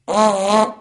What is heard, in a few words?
Vuvuzela